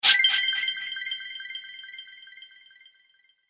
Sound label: Coin Magic, magic sound effects